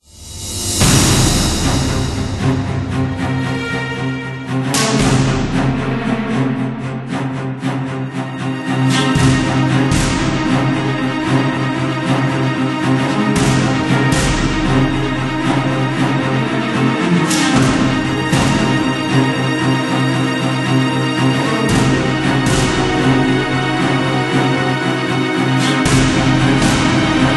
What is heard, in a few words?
Sprint Corridor Music; Hydrophobia